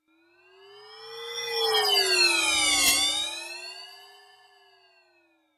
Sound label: Space Ship Moving